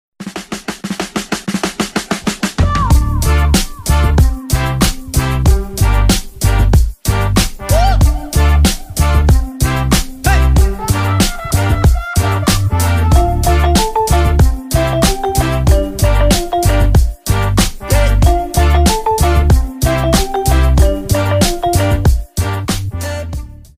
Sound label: instrumental ringtone